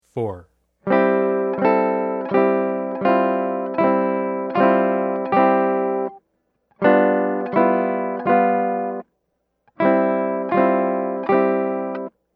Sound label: London Bridge